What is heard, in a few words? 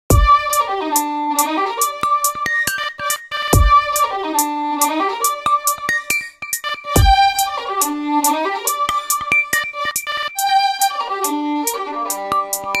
Concertina, Nokia Tones; Windows Phone apps